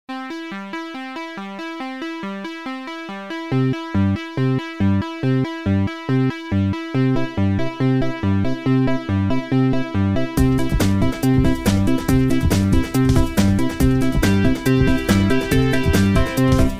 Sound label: Antisocial Strumming